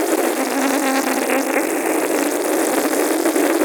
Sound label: Hoop Suction Slurping Loop